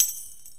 PERC & KALIMBA

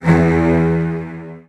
E1, Virtuoso Strings, 16TH; ILIO; Kirk Hunter; CL 16TH

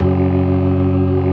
STOPS, Synth and Organ

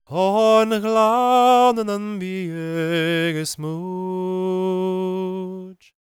GAELIC, World Voices; ILIO; Vocal Planet

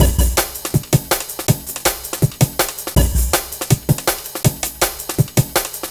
162 Dinky Break; breaks; m8 breaks

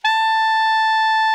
ALTO MF A 4, Sax; Greytsounds; Philip Wolfe David Crozier's Saxophones

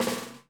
BUZZ, 50S JAZZ; Northstar - New Gold VOL-1

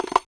Rek Raka; Roland BK-5; Percussion

